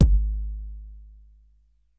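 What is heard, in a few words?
analog kick; 90 sSampleCDs; Crypton - DrumBase5000; ANALOG KITS2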